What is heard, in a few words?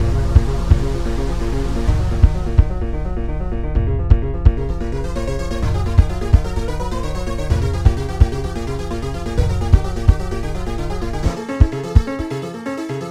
EDM 8 Bar Song; Reason